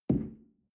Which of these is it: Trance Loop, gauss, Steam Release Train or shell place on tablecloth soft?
shell place on tablecloth soft